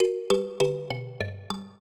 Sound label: trio, mbira; Mozart